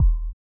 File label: SONAR; Spectrasonics; Metamorphosis